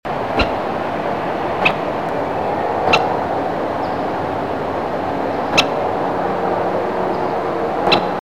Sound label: Western Kingbird